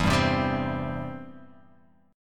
E Minor Sharp 5th E down

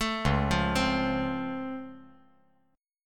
Db Augmented Major 7th, down; arpeggiated; JGuitar

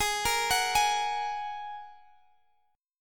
G sharp 7th Suspended 2nd G sharp; G#7sus2; x,23,20,23,21,x; arpeggiated

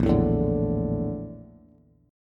Bb Major 7th Flat 5th Bb Bass; BbM7b5 strummed; JGuitar

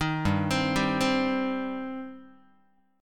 G Suspended 4th Sharp 5th, Guitar; Gsus4#5 arpeggiated; JGuitar

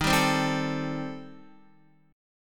D sharp 6th Flat 5th, D sharp, down, Guitar

